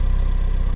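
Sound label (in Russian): тихий приглушенный ровный звук работающего двигателя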